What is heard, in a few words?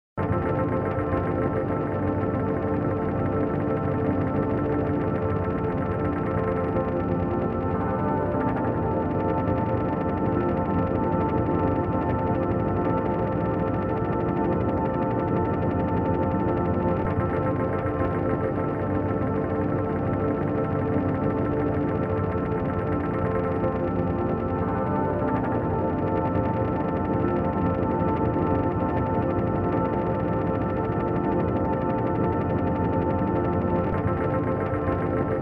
loop